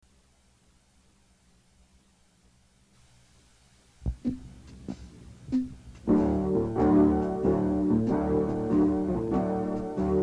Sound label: short song chorus